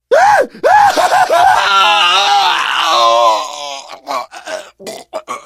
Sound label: death scream, biker, survivor voice